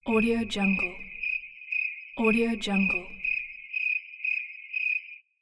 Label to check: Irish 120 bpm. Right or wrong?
Wrong